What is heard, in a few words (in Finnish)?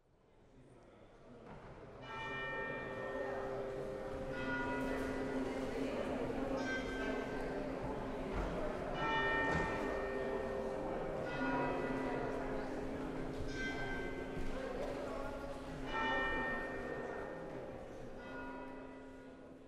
Kellot